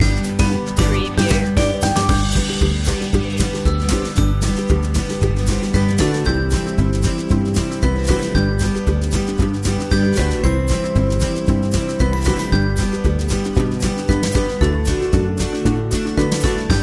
greet the day loop

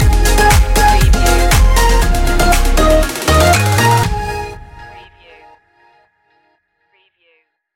tropical summer stinger

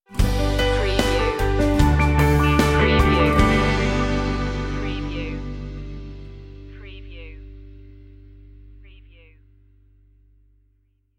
dreamy days stinger

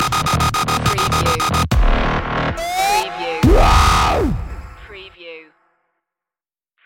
dubstep stinger

